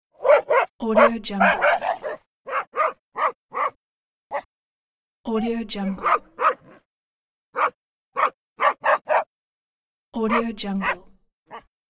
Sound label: aggressively barking adult dog dachshund; kaelv